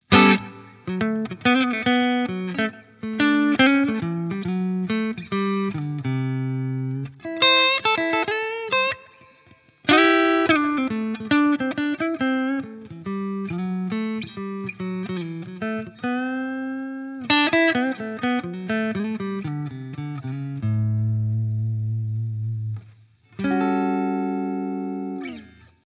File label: FGN Neo Classic LS 30 Hals clean